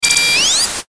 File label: Stutter Distortion Ascend Phase